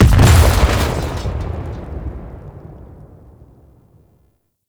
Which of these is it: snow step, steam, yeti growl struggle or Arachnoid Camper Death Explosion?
Arachnoid Camper Death Explosion